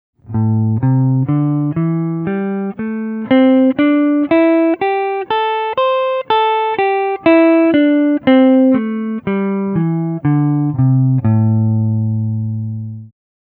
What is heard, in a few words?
Minor Pentatonic Scale, guitar